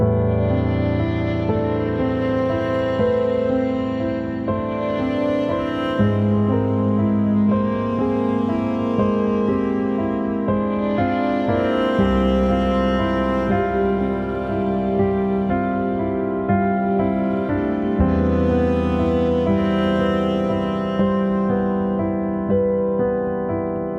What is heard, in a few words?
Melancholy Movement, Free Loop